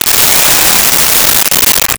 Electric Drill; Tools; 1001 Sound Effects

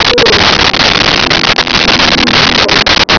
vox bantha

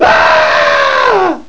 AAARGH; Spraak

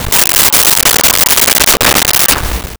LoFi Synthetic Squawk; Vintage Cartoon; Comedy Vocals; SONY PICTURES SOUND EFFECTS SERIES

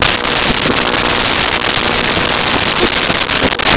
Tune AM Radio; Communications

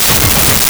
skratch

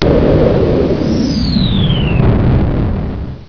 SSHIP, motoren

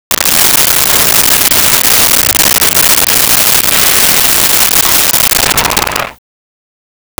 Slot Machine Jackpot; Vintage Cartoon; Foley

